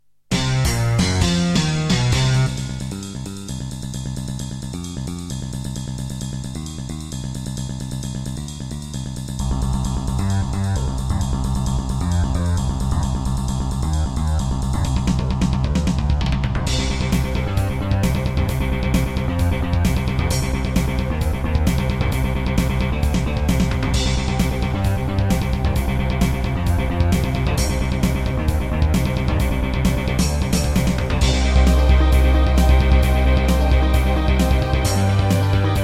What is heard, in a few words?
Casio GZ-50M; sigil doom episode 5